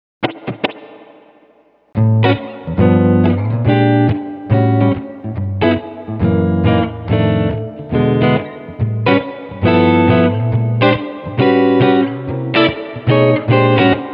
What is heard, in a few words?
spring; BigSky; reverb; Intelligents